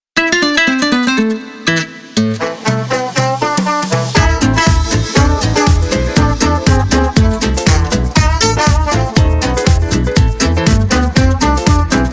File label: short logo intro electro swing